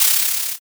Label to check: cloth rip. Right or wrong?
Right